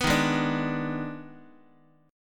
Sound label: D sharp Major 7th Suspended 4th Sharp 5th, D sharp, down, Guitar; strummed; D#M7sus4#5; x,x,2,1,0,1; JGuitar